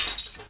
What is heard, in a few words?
physics glass shards default